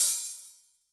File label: Open, HiHat, Yamaha DTXpress-II